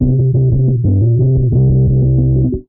bass outrageous, Drum loops, Funky

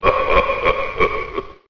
laugh

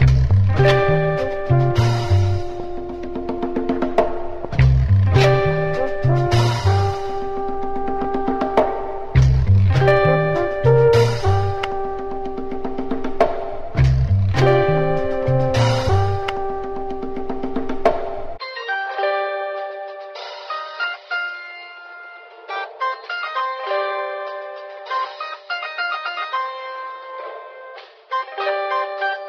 horns, phonky loops

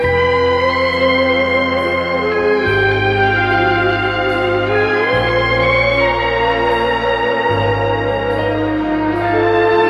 strings bittersweetness; Loops; Sad

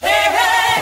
hey hey female SUNG

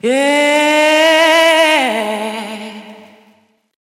"yeah" sung

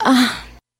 "uh" orgasm; ooh ahh

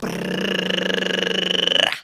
brrrrrr, Voice; ooh ahh

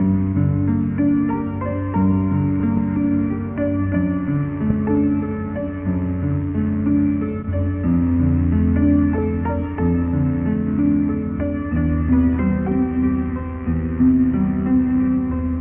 piano bad buzzing, Sad Loops

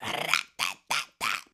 rat da da dah

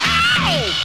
ow

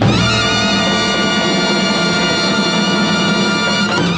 dramatic sting

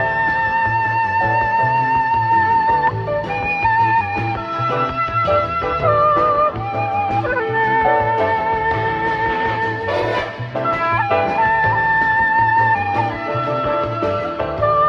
flute native head dress, Loops, Jazzmatazz

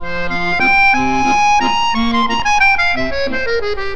keys, Loops, Sad

